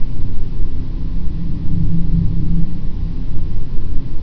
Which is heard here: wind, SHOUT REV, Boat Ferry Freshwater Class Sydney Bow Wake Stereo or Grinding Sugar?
wind